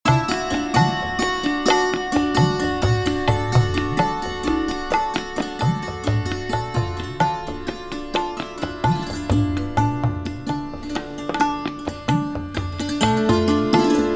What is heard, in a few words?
DEEPCHANDI Dhun